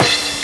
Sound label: CRASH; USB Soundscan vol.27; FILLACOUS